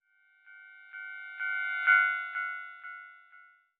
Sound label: sfx crossing pass; ゆめ2っき